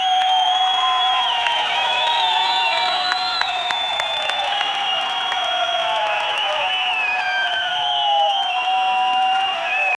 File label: tv football on iphone, football